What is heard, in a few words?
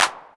Clap, retro house samples, Drum Hits